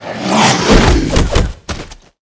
ape atk

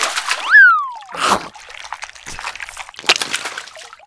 Penguin Action

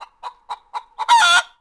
chicken; MilesWin

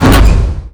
damage

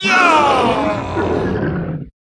Spade Trumpy Die

